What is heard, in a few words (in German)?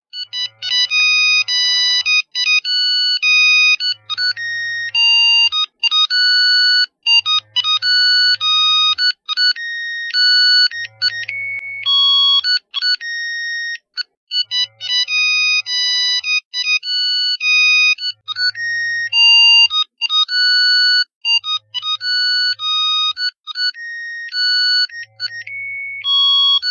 Classic Handy